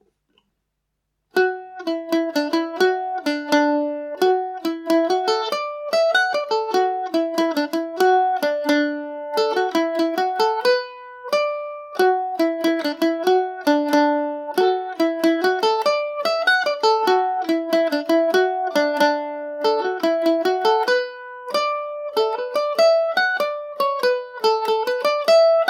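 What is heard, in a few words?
maguires kick revisited D major, mandolin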